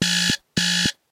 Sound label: Alarm Buzzer; Motorola; Edge 70 Max China; alarms